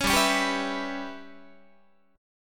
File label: G 6th Flat 5th G, Guitar; G6b5 strummed